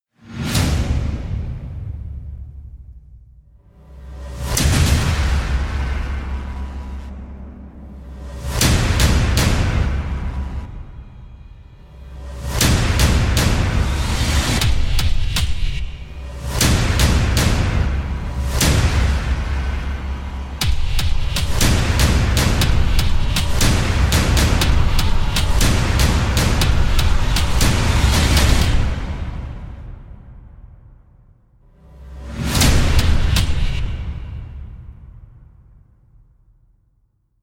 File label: trailer backends kill switch perc